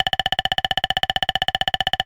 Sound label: cartoon bird woodpecker